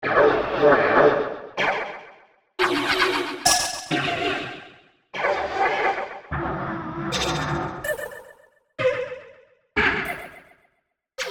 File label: Spooky Voices